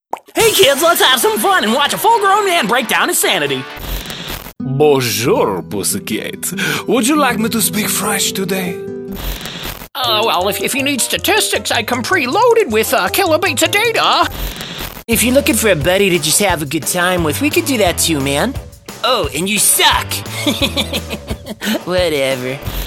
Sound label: Vox (music); Vox demo